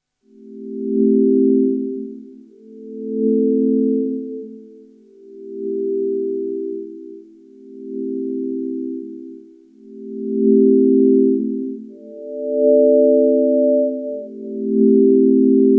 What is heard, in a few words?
Sonicware ELZ_1 Standard OSC Sine